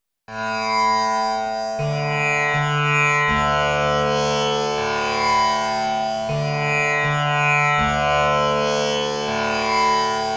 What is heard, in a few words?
tanpura low, d